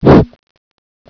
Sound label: jump